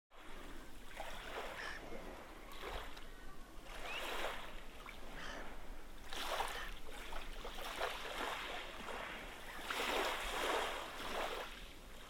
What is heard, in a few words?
gentle waves; whitstable